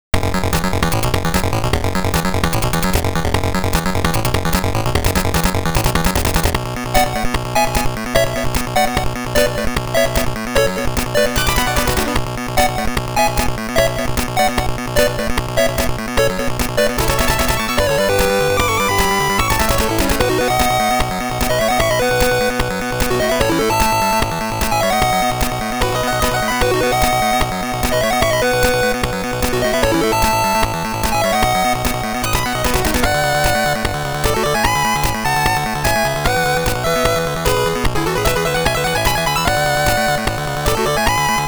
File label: BREAK OUT (Stage 2 BGM 2)